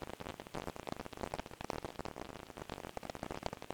fire smoldering; adarkroom

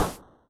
check traps; adarkroom

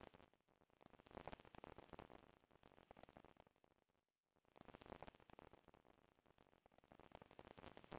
fire smoldering; adarkroom

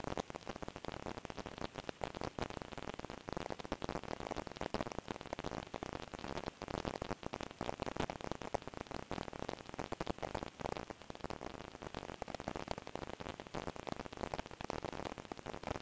fire smoldering; adarkroom

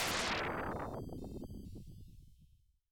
crash; adarkroom

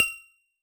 buy; adarkroom

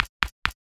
footsteps; adarkroom

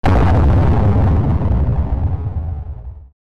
nuclear explosion

